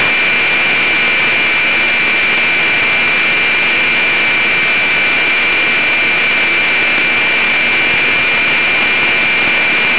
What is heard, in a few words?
static